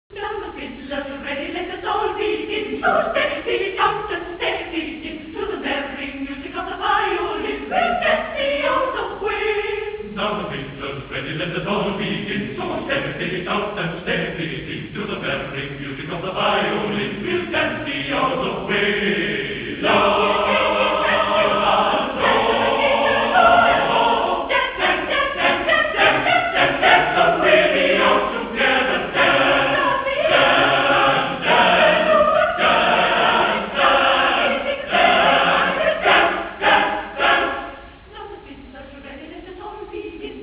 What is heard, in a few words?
Choir